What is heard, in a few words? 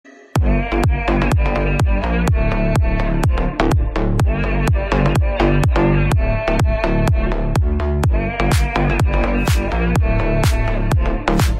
House Music Remix Ringtone